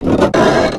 Structure Crunch; Damage; The Avali's Audio Overhaul

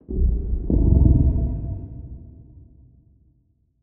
Ice shark idle; Barotraumatic

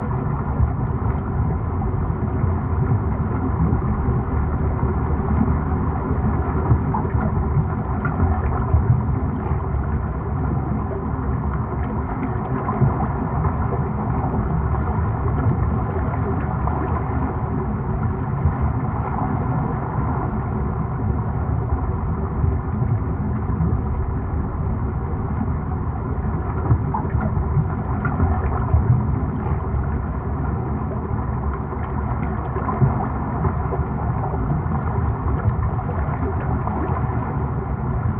Water Ambience In; Barotrauma; Room FX

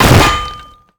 railgun; Weapons; EK modern; Barotrauma

